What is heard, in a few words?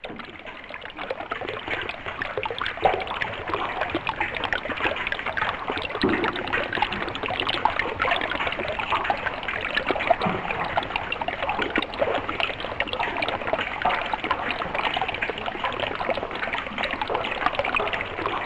OOZE BUBBS; Distored Reality